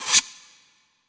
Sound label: Scratch Wet; Effects